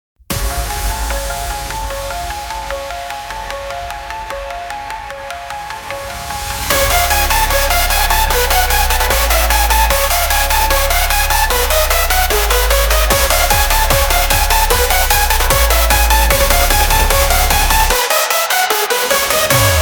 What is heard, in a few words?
Weird Hardstyle; AI Generated & Mastered